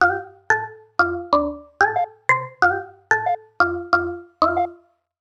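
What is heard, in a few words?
92 synth loop F major; islands in space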